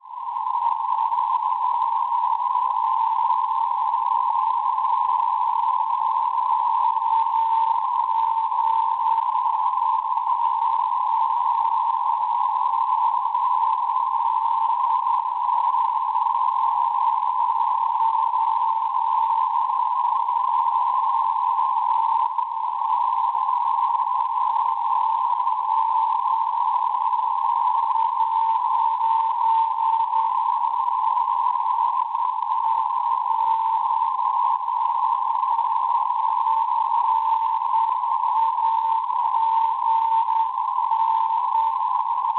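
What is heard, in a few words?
non-directional beacon 400 kHz; EN; Orebro; Svezia; Schwarzsee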